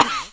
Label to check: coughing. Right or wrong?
Right